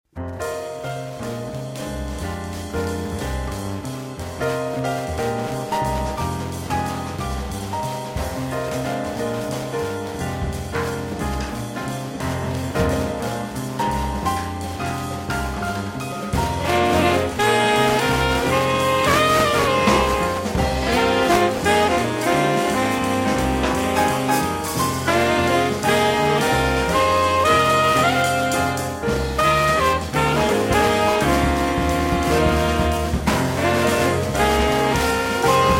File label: African Chant in F Minor